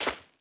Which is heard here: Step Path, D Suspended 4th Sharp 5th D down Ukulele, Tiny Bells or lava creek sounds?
Step Path